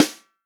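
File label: snare drum, Real Drum Kit; POP KIT; Best Service ProSamples vol.43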